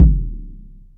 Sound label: Kick, Roland MV8800; PLUTO KIT